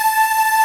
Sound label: Ending loop; SNES; Dracula X (Castlevania V - Vampire's Kiss)